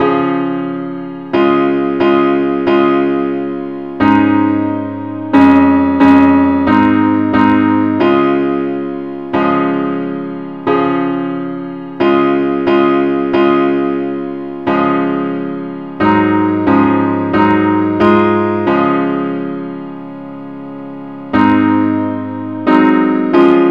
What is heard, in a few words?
parts only key cis